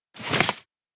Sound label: open view; sniper